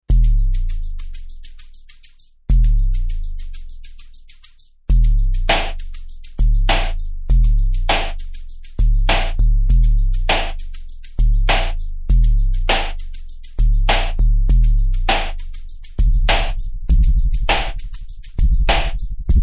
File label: Kick